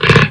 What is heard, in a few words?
hammer; Tanks